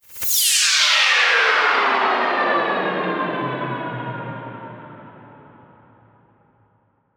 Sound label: Tearout FX Transiton; XLNT Tearout Vol.1